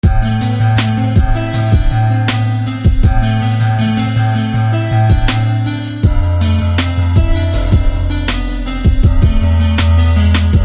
Metal Grand; SFB FX

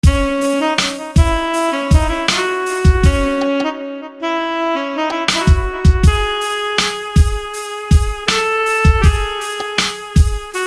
Tenor Sax; SFB FX

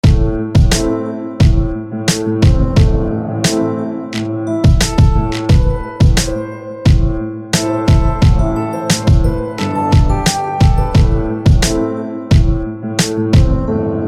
Keys; Solarus; SFB FX

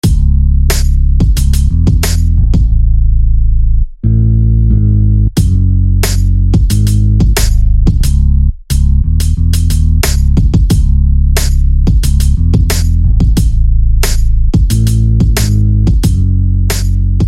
Bass; Temper; SFB FX

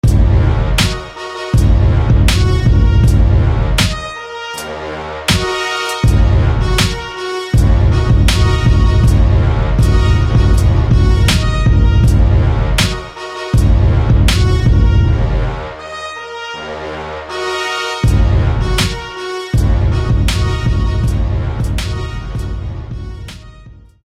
Rich Brass; SFB FX

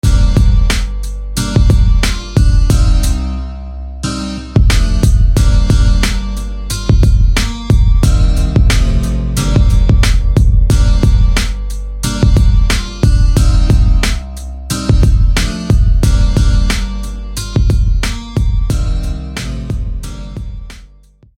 Glass Guitar

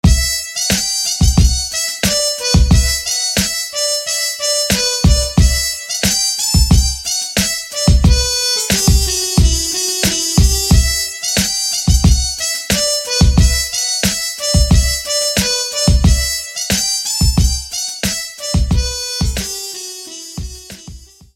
Mute the Brass; SFB FX

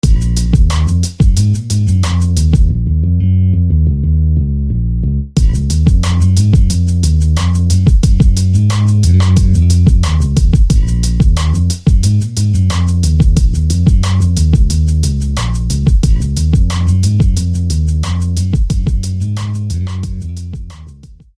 Dark Stage Bass

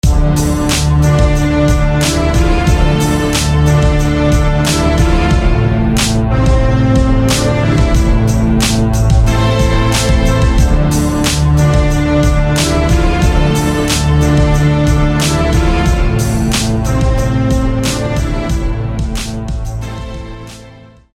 Grand French Horn

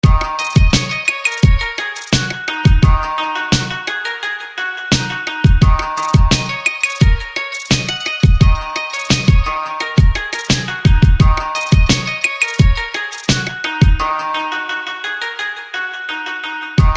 Koto; Koto Blaze; SFB FX

